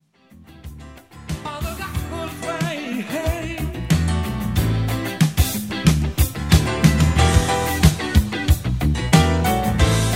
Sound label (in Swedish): Pianosolot; Gospel Train